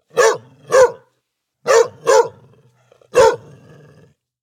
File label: bark; CosmicRage